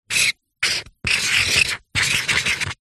office pen highlighter draw shape on paper on cardboard